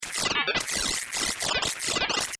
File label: Science Fiction Communications Scrambled Radio Beeps